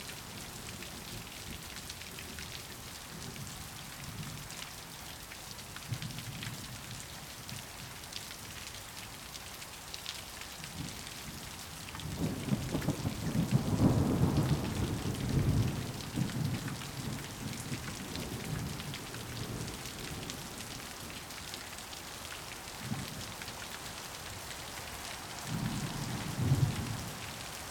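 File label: Atmosphere rain yard; Superhot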